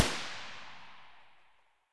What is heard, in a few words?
aksu close tail; loop; Escape from Tarkov